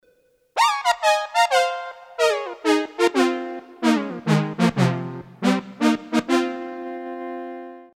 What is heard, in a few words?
Arp Quadra Intervallwrite